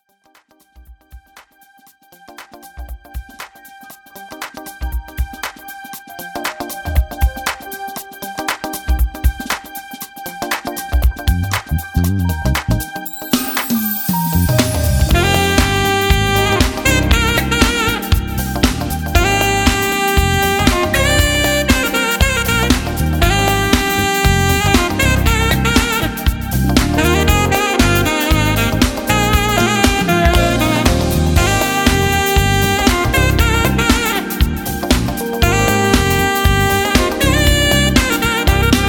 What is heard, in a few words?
Sunrise Instrumental